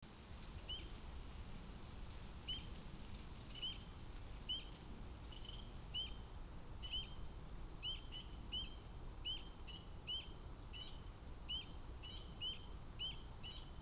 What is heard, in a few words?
pseudacris crucifer; iNaturalist